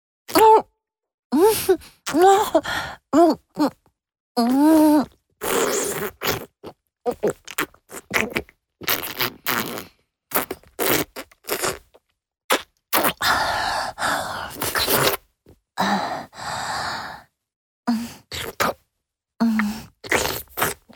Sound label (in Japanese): 口内射精→飲精, チュパ音 高音抑制; ２B チュパ音抜粋集